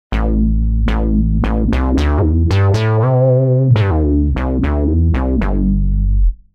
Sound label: Juicy Bs; TURBO VL